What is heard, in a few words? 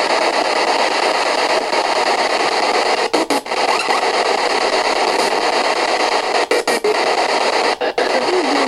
sound distortion